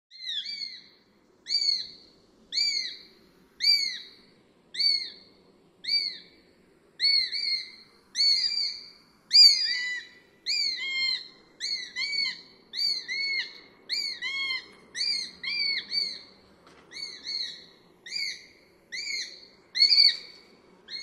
SPARROWHAWK

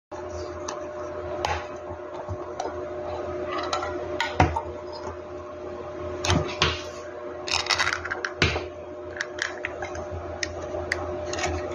canned, باز کردن کنسرو

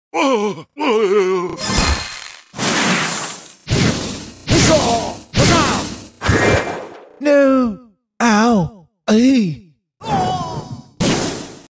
Wizard, کلش اف کلنز

